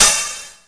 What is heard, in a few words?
open hi, Emu Sp12; Drums